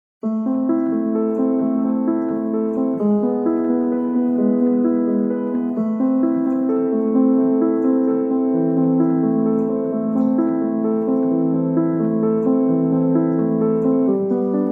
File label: Old Piano